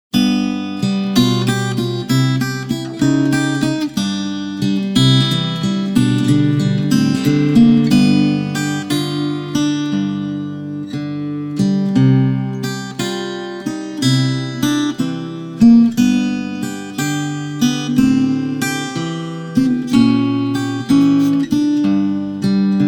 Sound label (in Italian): Bablues